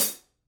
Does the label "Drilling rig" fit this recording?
No